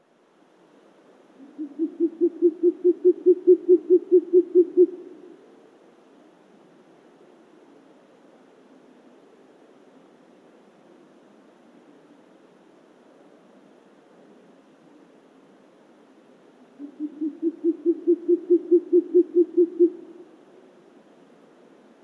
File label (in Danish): Mosehornugle Asio flammeus